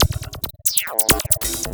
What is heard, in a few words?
percussion loops 138, Techno; PS-45